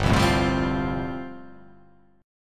G 9th Suspended 4th

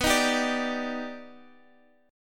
D sharp Augmented Major 7th B, chord

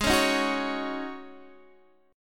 G sharp 7th Suspended 4th G sharp, Guitar Open D

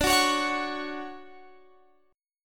D Major 7th, Guitar, Open F; DM7 strummed

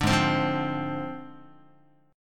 G sharp 6th Flat 5th G sharp Guitar; strummed; JGuitar

